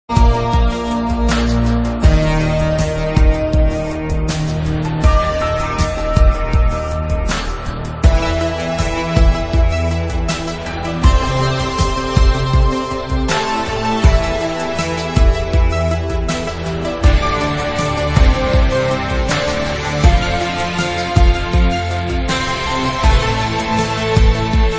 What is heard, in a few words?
Relaxing Ambiance